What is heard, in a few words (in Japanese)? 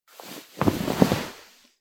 布団に押し倒される